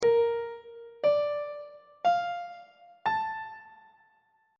a phrygian 7th chord 2 is b flat major 7th on treble clef